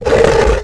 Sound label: board transition; skate shop; Tony Hawk's Project 8; XBOX